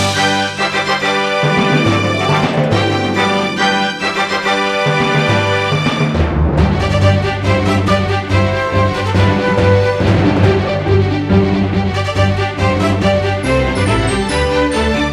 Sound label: Desert Cult fanfare Intro; Shantae and the Pirate's Curse